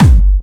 Hardstyle

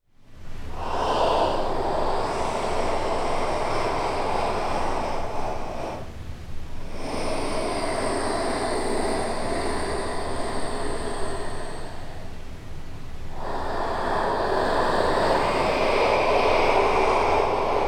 Long Fuzz

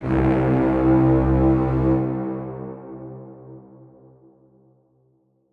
Brass Hit

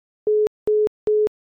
Phone Call Disconnect

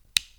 Click

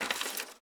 Paper Handling

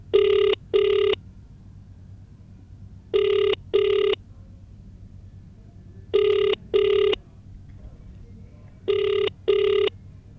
Dialing Tone

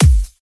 Electro Kick

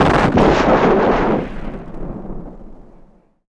Cartoon Explosion Designed On Cassette Tape

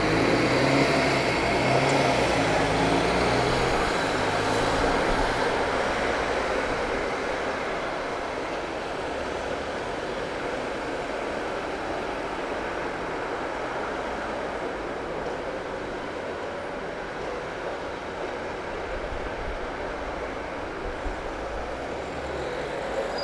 Traffic Jam

